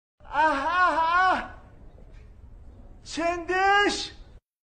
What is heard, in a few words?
ah ah